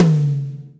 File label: LTom